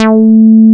BASS A4 MF; MR.J BASS

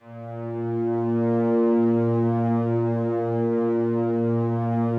slow strings A#1, Yamaha VP-1; 90 s Sample CDs; Music Store

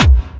KIT, Dance Mega Drums; Best Service

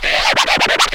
FX scratch; Club-50 - Foundations Roland; Scratch 1 S

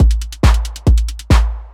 drum 138, Techno, drum-loops; Best Service ProSamples vol.45 - Techno ID; xtra-drumloops

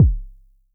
K 808 ish, Vermona Mono15 Drums Kicks

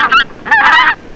GOOSE; CMI Sound Library Rev 1.3

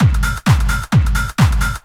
techno, DRUMLOOPS; Ueberschall; Techno Trance Essentials

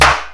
INST CLAP; USB Soundscan vol.27; Ragga & Dub Reggae; AKAI; CLAPS KIT

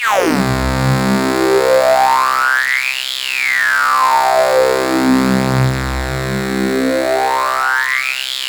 MEGA SWEEP, OBI DRONES; Zero-G Ambient 1

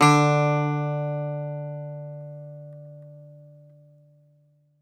Greek Bazouki, Plucked Strings

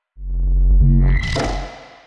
neuro, bass

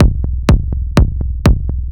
Strip 124bpm, House Drumloops

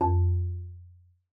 marimba F2; Xsample VOL-4

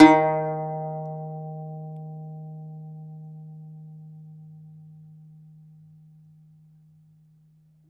DOMRA, Segundo Domra, Plucked Strings

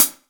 XV Hat C, Roland XV-5080

